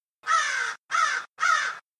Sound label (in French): corbeau